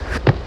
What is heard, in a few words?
jump; vortex; bart